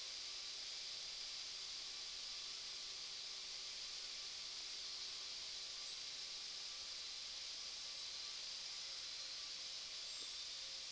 whistle song

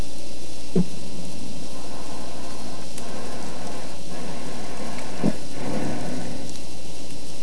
Breathing, Sea Sounds

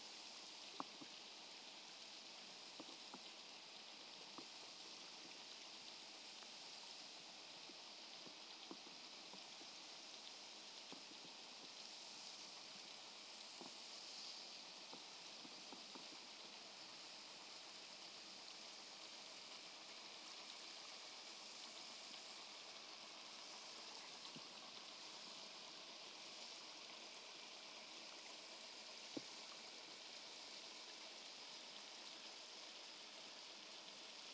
breach

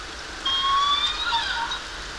call, hydrophones

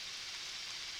Background Noise

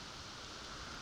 Background Noise

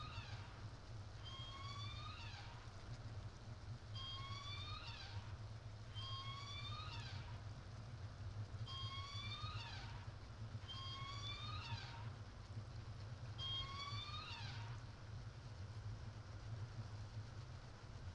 kw playback

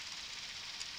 Background Noise

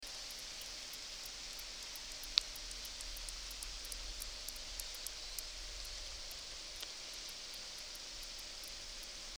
Orca Clicks

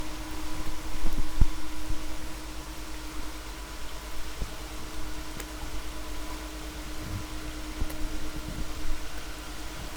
No Ship, helicopter

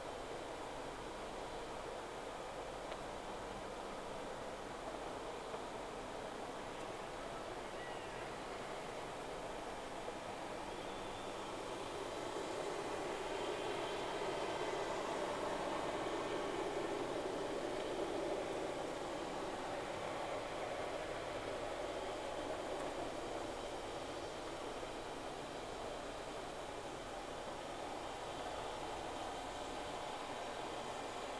Whales; SeaSnake

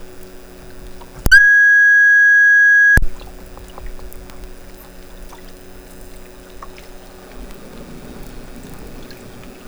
background, hydrophones, alec bay

